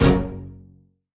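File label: Cpu Style Orchestral Hit, stinger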